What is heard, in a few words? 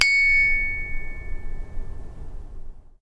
chinese bronze bell side; sackler